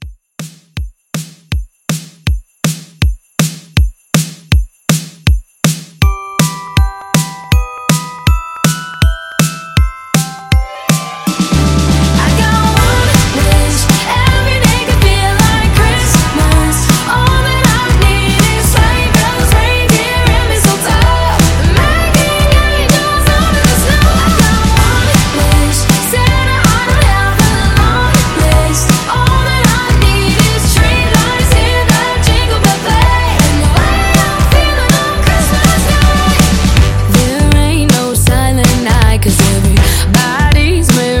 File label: Christmas Re Drum 160